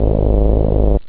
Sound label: synth; da capo vol1; instruments